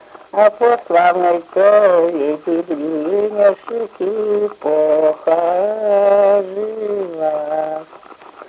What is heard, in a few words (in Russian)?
Былины; Пришли домой. Илья по комнате похаживат; мезень; Речевой портрет